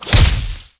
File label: claws kill; weapons